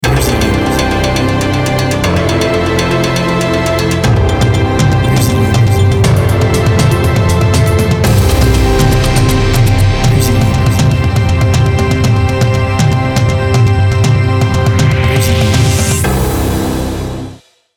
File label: triumph, jingles